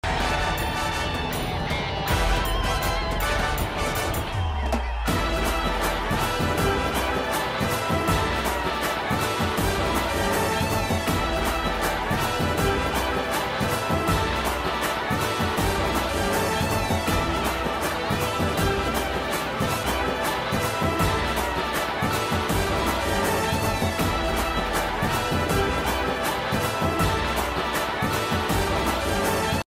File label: ringtone theme song; a1 express